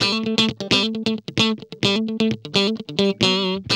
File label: GUI, licks; 130 LICKS